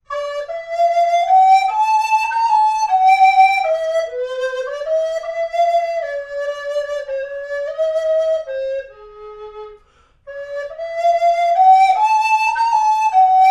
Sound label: green sleeves alto, recorder; Tategoto